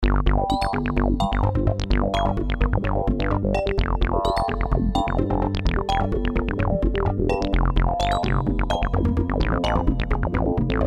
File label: DX7-V